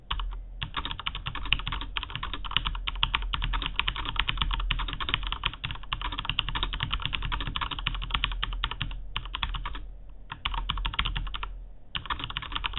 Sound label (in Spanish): Sonido MSI GK80